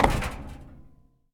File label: landing metal; Escape from Tarkov